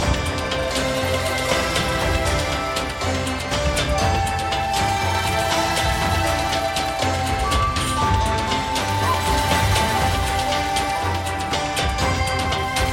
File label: music big win; medallion; Fantasma